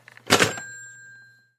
phone slam

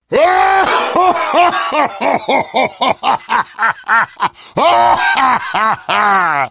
heavy laugh long

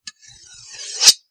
sword draw; gmod

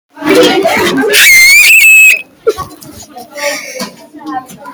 lil girl aaaaaaaaaaaaaa

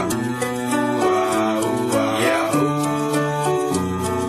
ooooahh

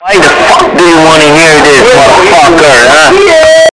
ahh anime sounds

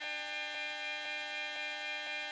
hum

